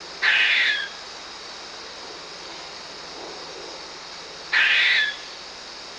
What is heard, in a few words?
bird